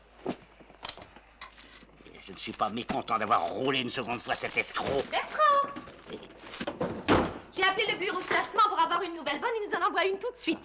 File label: crying; oscar